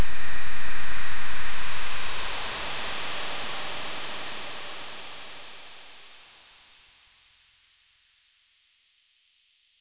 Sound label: legacy whisper fadea smok, smoky